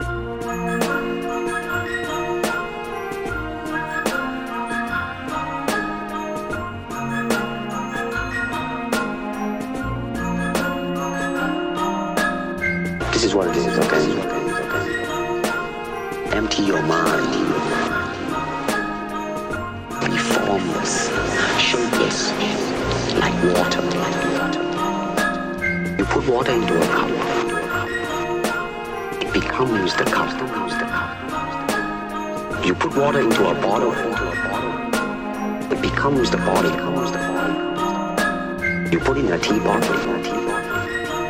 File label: beats